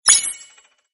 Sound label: Coin; Rapo